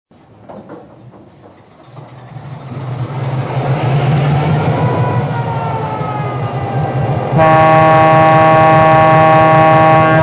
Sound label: EMD F-3, trains